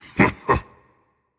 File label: heh heh rage; chaac